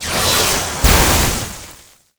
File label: Lightning Shock